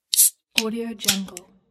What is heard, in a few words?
soda can opening